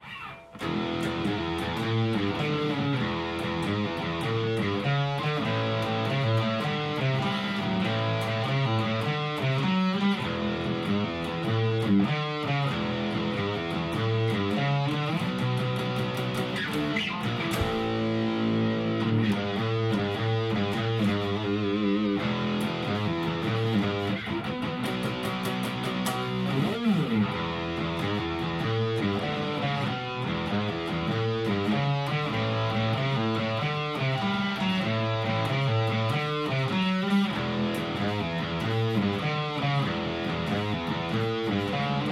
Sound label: Rock n Roll